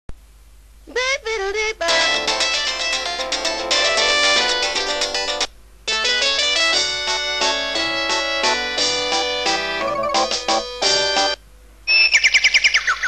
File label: samsung ringtone